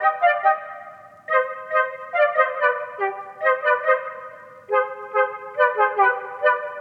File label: Simple Melody Loop - 141 BPM C Min; Cymatics; Oracle Sample Pack